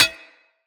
Bullet Impact Armour; Weapons

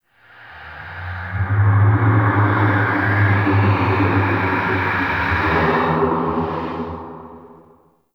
Ghostly Whoosh E; Ambience; Warframe; Man In The Wall

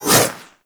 Kunai Whoosh; Warframe; Weapons; Stalker Kunai

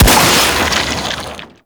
Infested Sniper Rifle Shot; Weapons

